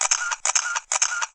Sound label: Shutters three slow